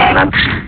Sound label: robo